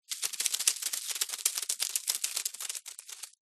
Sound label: spider long legged run scuttle through leaves dirt undergrowth; animals insect